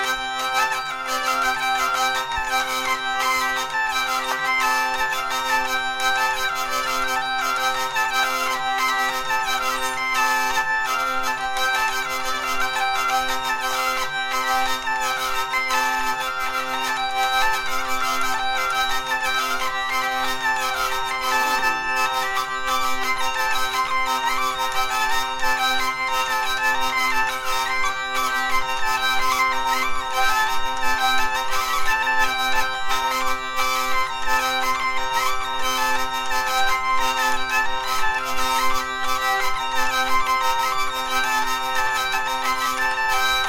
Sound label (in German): Lautenform